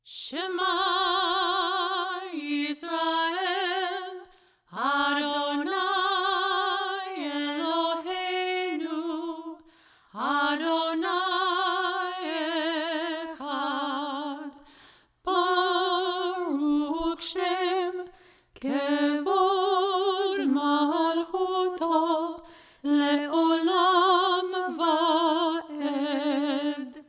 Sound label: Shma; Solomon Sulzer